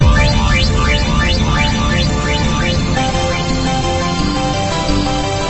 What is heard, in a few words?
melody